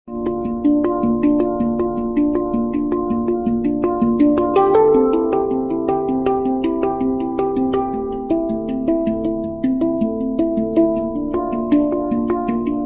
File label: 9 notes bronze, handpan